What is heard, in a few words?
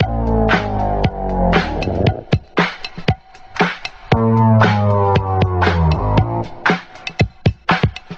funky whirr, drum loops